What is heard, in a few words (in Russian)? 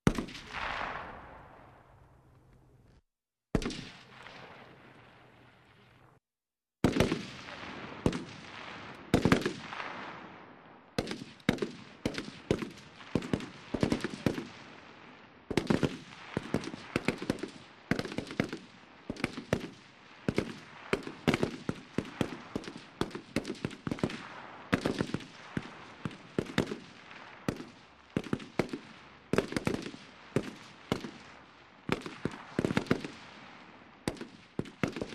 Avt ruzhe M16